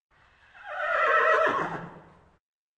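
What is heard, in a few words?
neighing in a stall close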